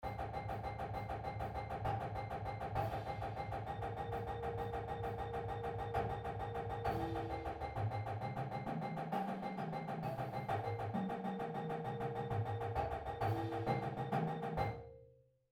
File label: Taps Accents, Drumline; Drumline Exercise; pdlmusic; Drumline Ensemble Series